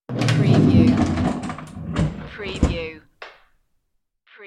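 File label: Rolling Drawer Open; Sounds in the home of drawers being open or shut.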